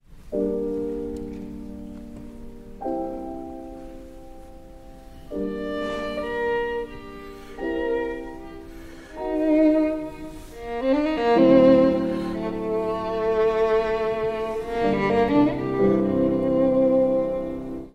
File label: cadences